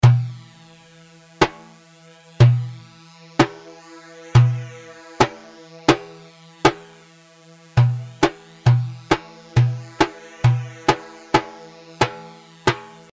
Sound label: Merjaf; Merjaf 8 مرجاف